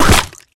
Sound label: Easter Clover Destroyed; fowl; Easter Blocks